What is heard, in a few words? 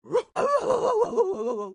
dog med howl